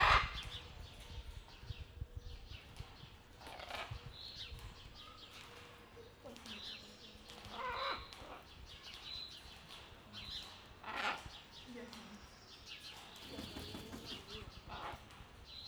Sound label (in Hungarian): szellő, zöldszárnyú ara; szegedi zoo 2011